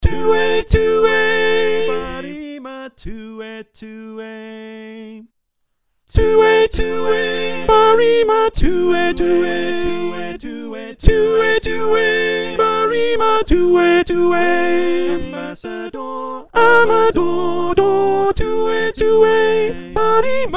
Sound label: Soprano